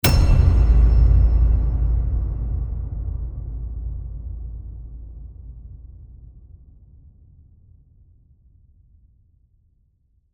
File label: Epic Low Hit